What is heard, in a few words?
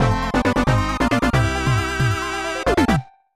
Area Clear; Super Tekkyu Fight; SNES